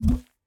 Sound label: paperlauncher paper gun